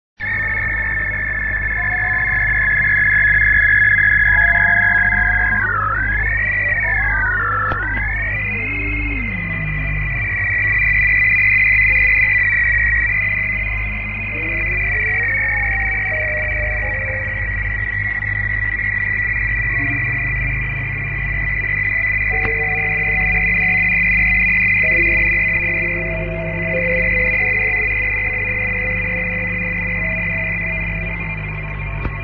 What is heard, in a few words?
usb